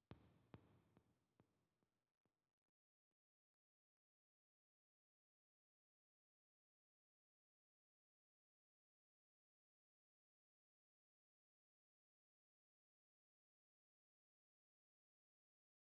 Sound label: Lead Guitar, 105BPM Emin; 99Sounds; Monosounds; Music Loops; PANDORA BOX!; Motel Love